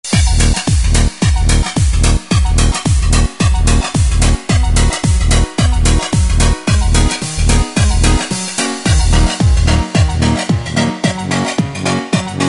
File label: disco tanz